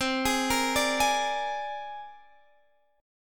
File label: C 7th Suspended 2nd Sharp 5th C down Guitar Standard; C7sus2#5 arpeggiated; JGuitar